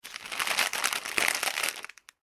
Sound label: Plastic Packaging Movement; Plastic Packet; Materials; McKinney Sound